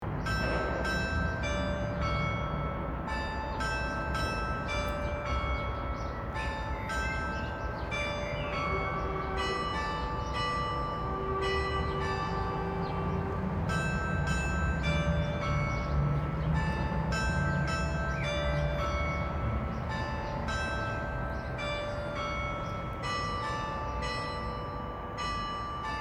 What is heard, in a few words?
Glockenspiel